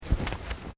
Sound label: FOOTSTEPS TABI TATAMI MATS STEP; Footstep Foley; Human